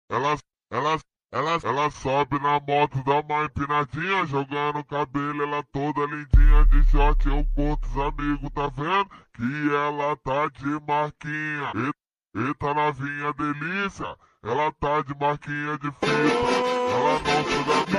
viral troll face ringtone bgm ringtone

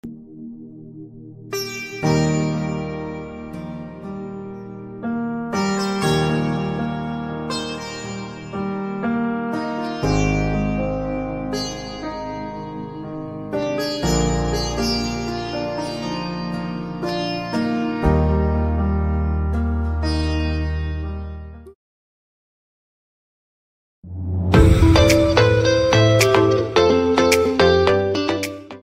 sitar ringtone

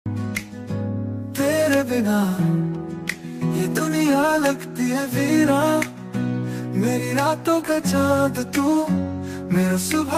Hindi Ringtone Ringtone Song Love Ringtone

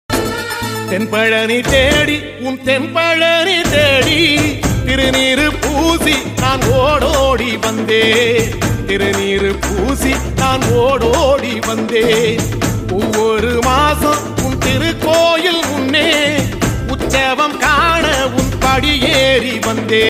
songs ringtone tamil ringtone tamil ringtone song; murugan

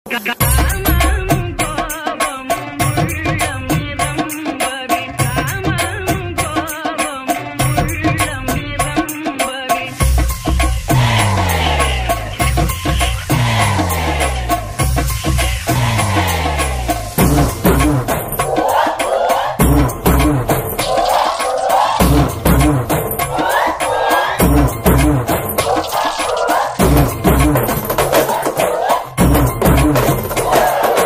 song bgm, tamil

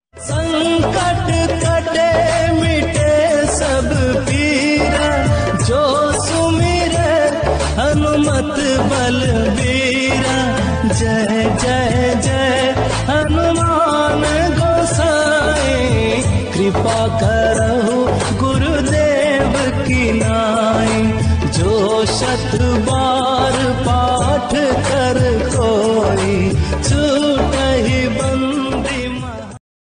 ringtone, background music; hanuman chalisa

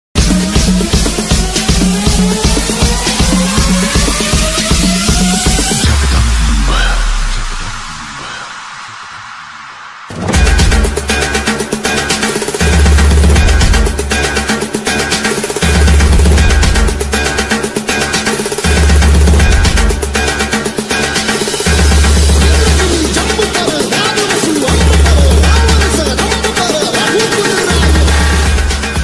marathi ringtone

